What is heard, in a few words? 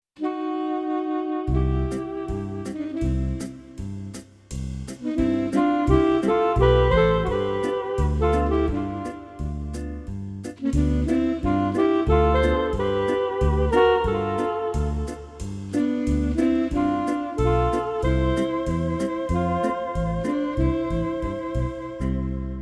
Alt Sax